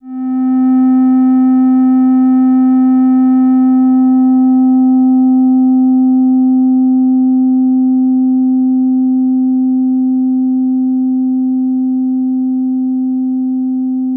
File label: SYNTH Soft Focus Lens